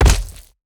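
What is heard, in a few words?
Footsteps Aggro Walk Forward Left Mud Dry; BIGMOUTH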